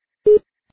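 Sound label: power on; soundbox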